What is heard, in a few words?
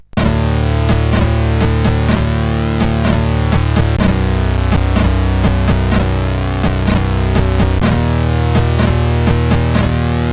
Hard n Heavy, CSG8580R5; Zool